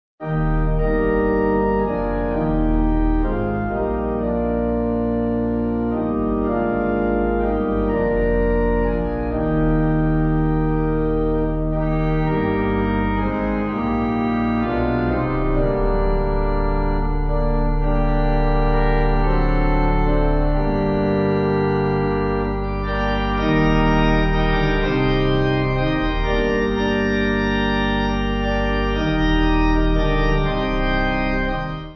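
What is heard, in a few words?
Pipe Organ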